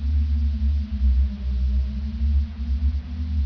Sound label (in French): grotte